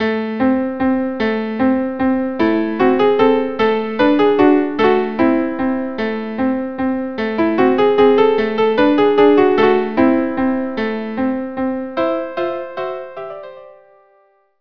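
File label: Vals violin violin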